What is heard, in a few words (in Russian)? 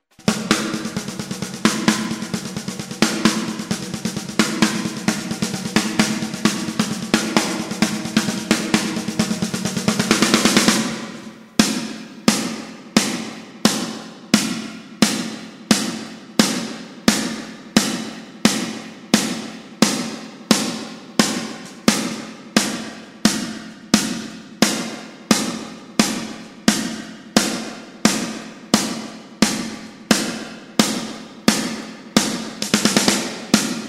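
малый барабан сильно загейтирован; для большей агрессивности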